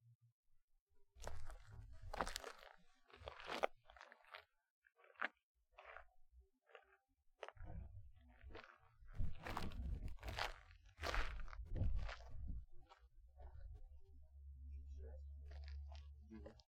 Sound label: Muddy Walk